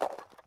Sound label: mud walk, step